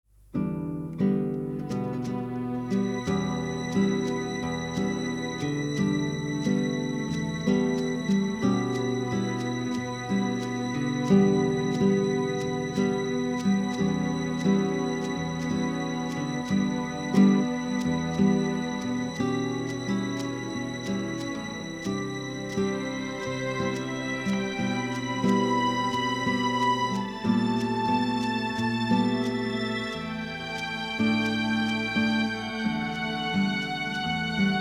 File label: bossa, 48kHz